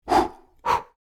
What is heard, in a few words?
Human blowing short